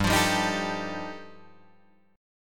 G 7th Suspended 2nd Sharp 5th G chord, Guitar Drop D